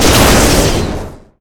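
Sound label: lightning gun fire; gusanos promode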